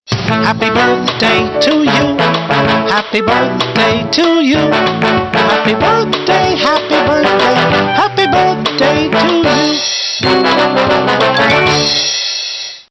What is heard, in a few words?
SATB